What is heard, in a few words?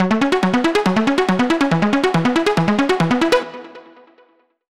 dubstep club arp